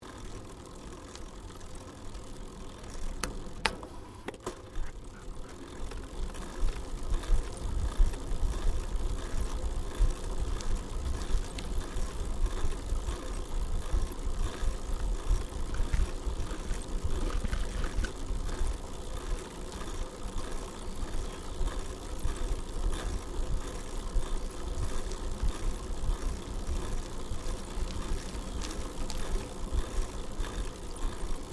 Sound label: Bicycle Riding Smooth Bitumen Pedalling Uphill Stereo, 21 speed road bicycle; Giant Crx3